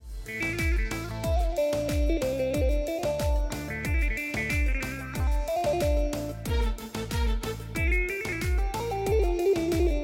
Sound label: Ringtone; ترکی